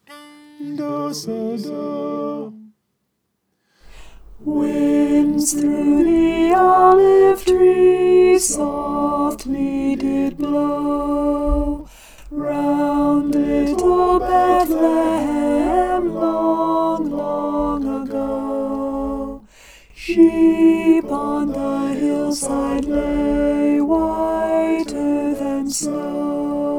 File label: alto